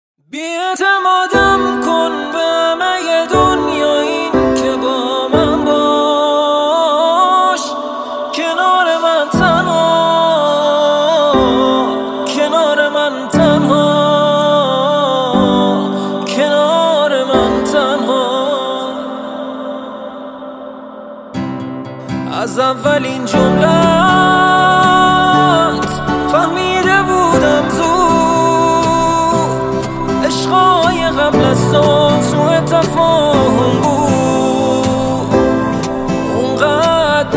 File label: Guitar Version